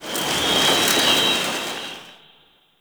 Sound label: Destruction Space Wind; Ambience; Warframe; Corpus Railjack